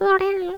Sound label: mumble; World Of Goo